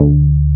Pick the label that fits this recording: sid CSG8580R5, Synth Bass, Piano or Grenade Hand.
Synth Bass